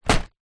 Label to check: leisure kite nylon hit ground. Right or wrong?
Right